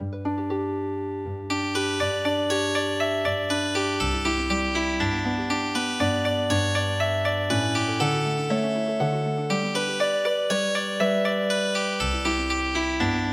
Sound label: Healing Harp; The Little Old Sod Shanty On My Claim; traditional; midi